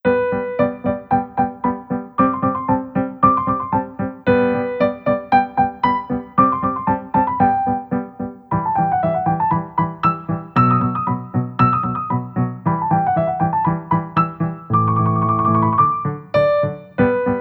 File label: Rondo a Capriccio, piano; Beethoven; Rage Over a Lost Penny; Op. 129; piezas faciles piano